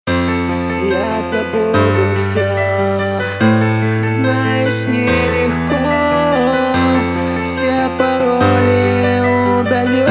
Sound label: Piano Version